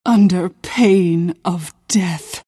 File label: pain death; queenofpain